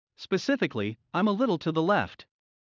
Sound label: Heart mid; Classroom humanbody